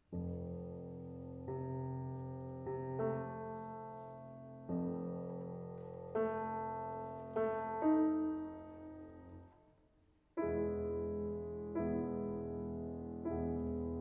Piano Sonata No. 21 in C Major, Op. 53 Waldstein II. Introduzione. Adagio; Adagio Molto